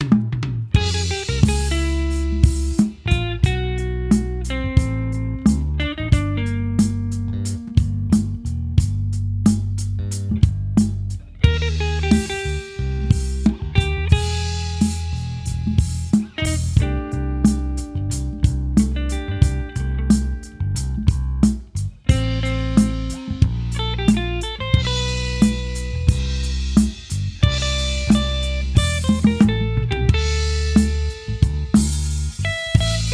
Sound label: Less Tense Faster with Nice Melody